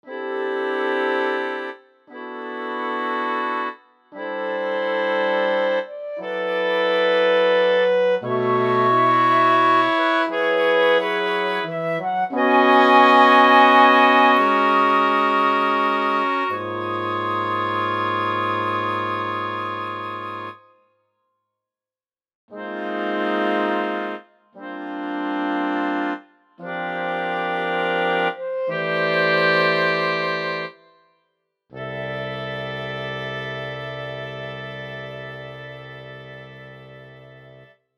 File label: Fifths for Five Instruments